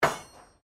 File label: Blacksmith Hammer Strike; Colonial era objects; Tools